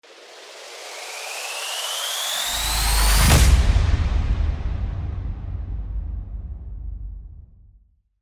RISING IMPACT

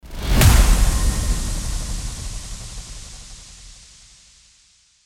IMPACT

